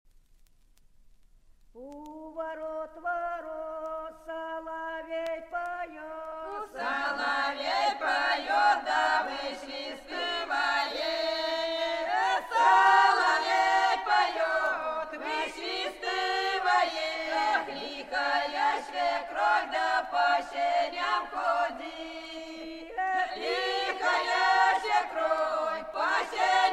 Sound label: russia, polyphony